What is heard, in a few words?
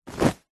clothing jeans denim pants trousers drop carpet